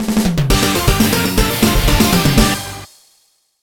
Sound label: Jingle; Zoids Saga DS Legend of Arcadia